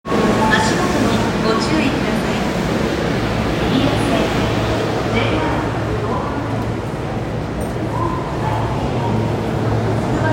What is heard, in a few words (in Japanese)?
千代田線北千住西, train sounds